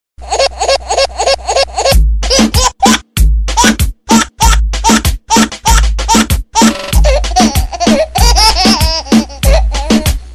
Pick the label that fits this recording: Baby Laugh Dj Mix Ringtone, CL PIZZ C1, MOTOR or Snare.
Baby Laugh Dj Mix Ringtone